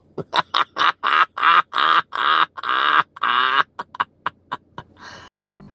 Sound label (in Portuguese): risada